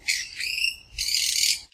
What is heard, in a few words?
bat